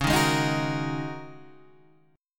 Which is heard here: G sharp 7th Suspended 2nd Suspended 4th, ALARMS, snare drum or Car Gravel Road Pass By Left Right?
G sharp 7th Suspended 2nd Suspended 4th